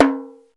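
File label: Timbale, Roland S50 Percussion; Drum Samples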